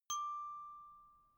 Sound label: notification glockenspiel single low